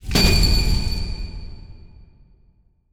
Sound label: UI Fire Confirm; User Interface